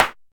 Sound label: shiny steel snare drum sound a sharp key, snare single hit tuned to the A# note